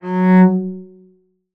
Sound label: F#2, Cello Marcato; Orchestral; DirectWave; Varazdin Orchestral